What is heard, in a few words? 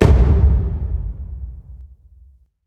taiko low; smite; raijin